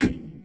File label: perc, 100bpm; algorave